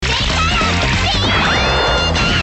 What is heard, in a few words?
laser eye beam